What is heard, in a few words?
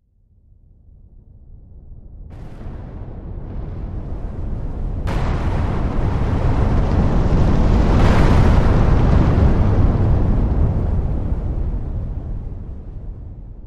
Sweeping Explosion, fireballs